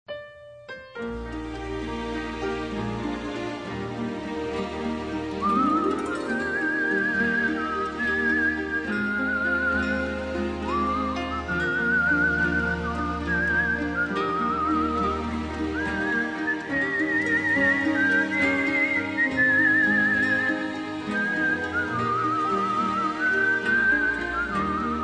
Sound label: Orchestra, Strings